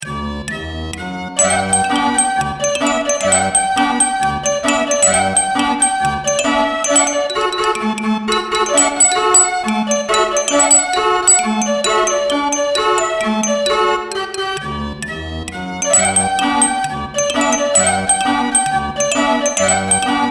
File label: Circus Music; don t be afraid the first toy